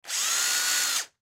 Screwdriver Drill Rev Short Electric; Drills; Industry; Blastwave

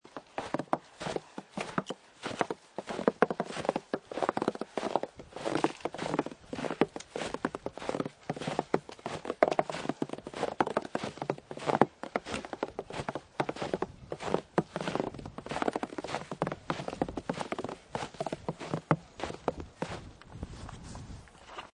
Snow And Ice Footsteps Snow On Wood; Weather

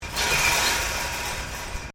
Wire Fence Impact; Hit Sound Effects

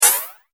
multimedia rollover, Multimedia Rollover Tone

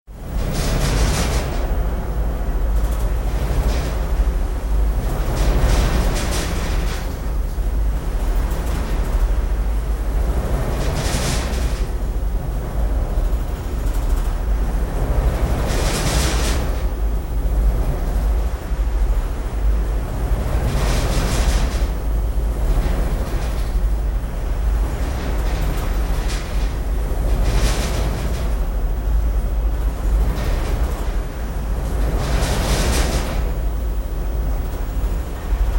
Ship Oil Pipeline Service Vessel Control Room

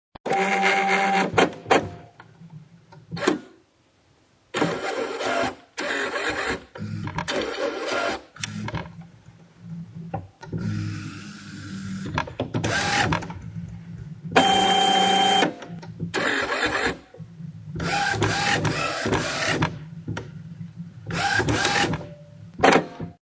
Prntr On, Turning on computer printer.; Computers; Electronics

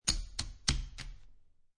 Poker Chips Stack Chips On Top Of Each Other; Gambling Sound Effects

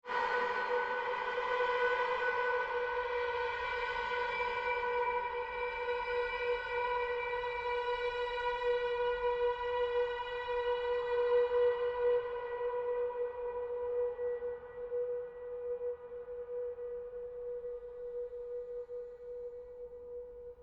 Created Pulse Fade, Rise And Dive Sound Effects; Sound effects created by manipulating other sounds; Rises and Dives

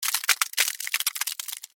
Ice Crack; Weather; Snow; BlastWave

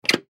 Hospital Window, Hospital Window Lock; Emergency

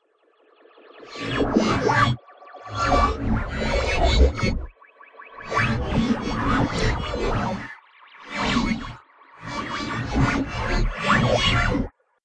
Alien Radio Tuning; Alien Sound Effects

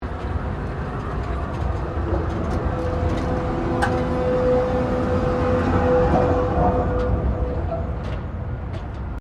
Tram Melbourne New Passing; Melbourne Trams; Streetcars (Sound Effects:Vehicles)

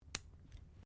NAIL CLIPPERS CLIPPING TOENAILS; Miscellaneous Human Sound Effects; Miscellaneous Human (Sound Effects:Human)

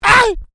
MALE VOCALIZATION ARGH; Male vocal sounds; Exclamations; Voice

